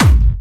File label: Harder, kicks